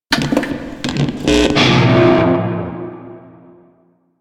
Shawzin Equip; Music; Warframe; Narmer Shawzin

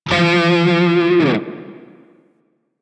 Grineer Shawzin Flourish Oct Two F; Music; DE; Warframe

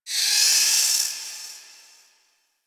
Sentient Lair Exterior Creature; Misc Creatures; Warframe; Ambience

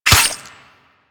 Melee Dagger Impact Flesh; Warframe; Weapons; Daggers

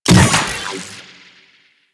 Shockwave Grapple Fire; Warframe; Venus; Venus Terra Shockwave Moa; Abilities

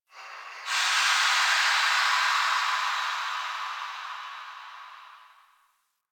Dist Steam Burst; Warframe; Venus Open World; Venus Solaris United

